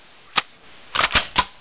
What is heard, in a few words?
sniper reload